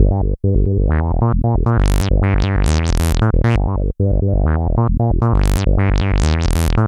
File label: Bass Loop